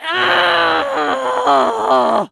male scream; npc; DeadFright

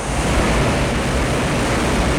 Steam Loop

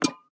Peg Leg Footstep

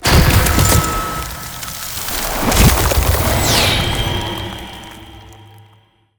Ice Spell Mobility Teleportation Frozen Pathway; Mobility & Teleportations; Arctic Aura

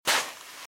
Footsteps Sand Dry Walk Right; RadwinIsland

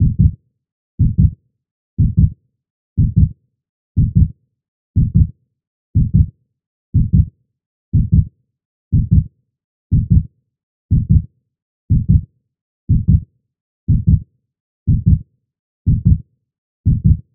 Heartbeat Fast, Medical; Blastwave

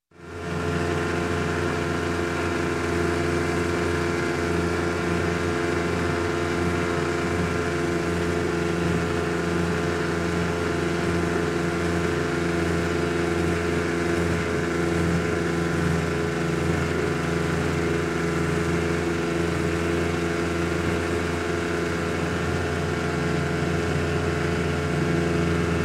Boat Outboard, 20 Hp - Driving Along, Marine; Sound Ideas; Series 2000

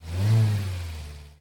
police eng rev nutral; Blind Drive

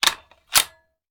Rifle Foley; Sound Ideas; Guns

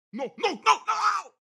town human road; Blind Drive

